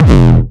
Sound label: 909 Grime Layer 2 - E1, Drums, Kicks; Mbase 11